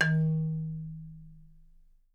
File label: D#2, Percussion & Harp; Miroslav Vitous - Percussion & Harp